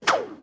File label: Grappling Hook Throw; Throw the Grappling Hook; The Wind Waker